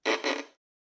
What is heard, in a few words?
Violin Scratchy Noises Short